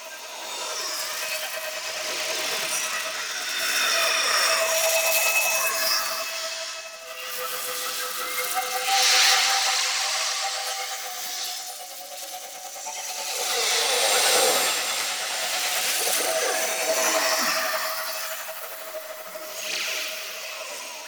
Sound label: Lair Floating Rock Pillar Loop; Warframe; Ambience; Sentient